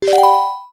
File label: Options Confirm